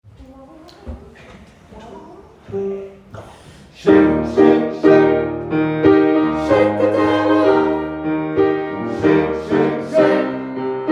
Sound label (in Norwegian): alle stemmer, gospel; kongsberg; kor; gospel for alle